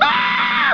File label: Ahhhh; Homer scream; simpsons